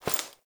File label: Body Move